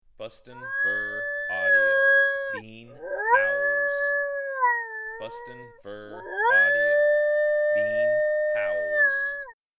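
Bean Howls, animal sound files for calling Predators and Prey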